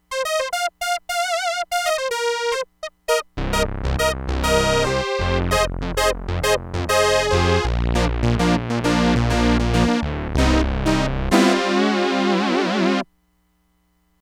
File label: LEAD (SAWTOOTH), Crumar Bit 99; Factory Programs